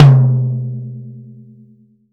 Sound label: PT2 FF, TOM POP